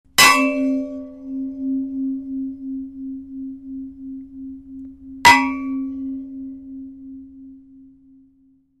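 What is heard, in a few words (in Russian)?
удар лопатой по металлу металлический звон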